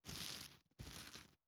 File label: Eat Fruit